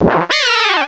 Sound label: cry